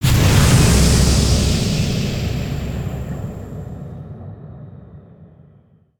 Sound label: Geiser Burst Big